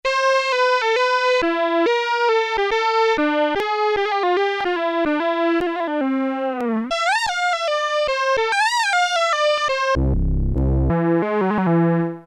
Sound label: Warm Square, Yamaha TX81Z